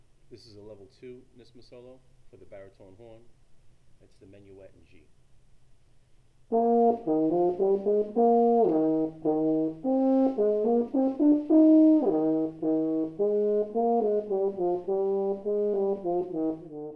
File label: Baritone Horn solo Menuet in G; Nyssma